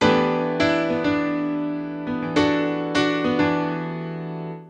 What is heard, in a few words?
Synt; SMOOTH 05 C